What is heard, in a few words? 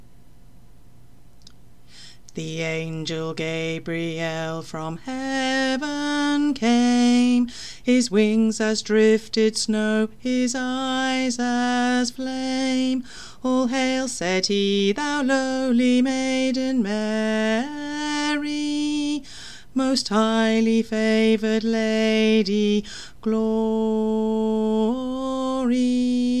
tenor, Choir Christmas; Angel Gabriel